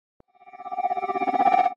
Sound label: HOUSE FX 125